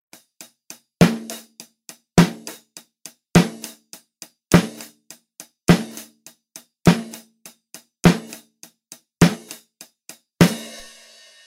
Snare Shure 588 Uni III; Test mikrofon

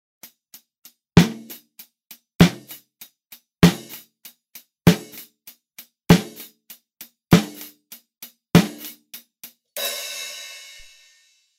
Snare Sennheiser MD421; Test mikrofon

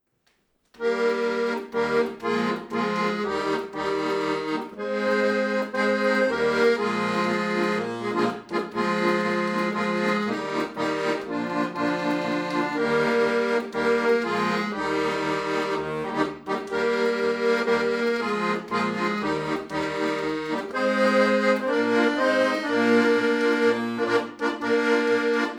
accord ortf 2x2.5m rodent5; Test mikrofon

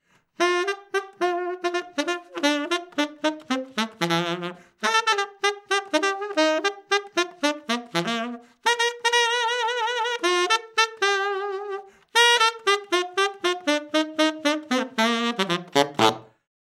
sax neumann u87ai; Test mikrofon

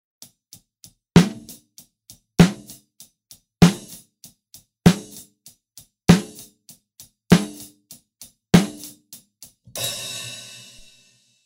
Snare Shure SM57; Test mikrofon

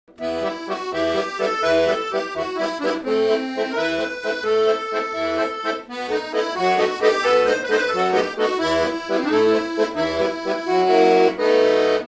akor ortf akg c451; akordeon ORTF; Test mikrofon

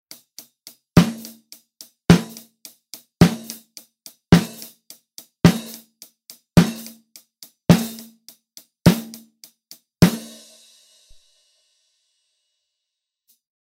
Snare Shure SM7B flat; Test mikrofon

